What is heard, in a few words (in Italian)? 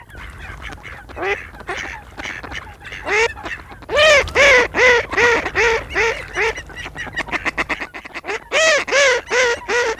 GERMANO